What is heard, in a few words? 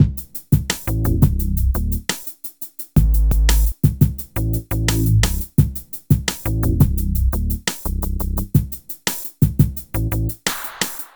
Call, Hip-Hop; Sonic Foundry (Sony Creative Software); Hydroponic Hip-Hop; Combo